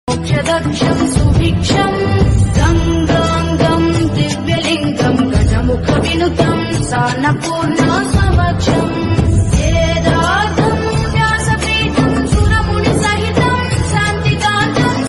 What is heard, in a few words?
telugu new ringtone telugu bgm; god